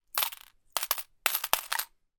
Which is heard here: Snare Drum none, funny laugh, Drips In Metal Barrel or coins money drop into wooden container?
coins money drop into wooden container